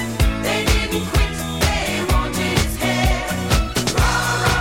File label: music; wu xing; earth